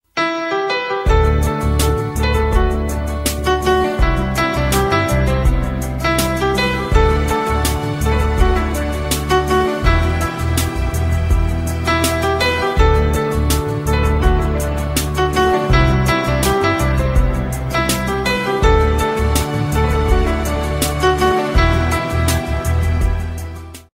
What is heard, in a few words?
Old Bollywood Instrumental Ringtone; ringtones